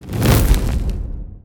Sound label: Flame Sound Effect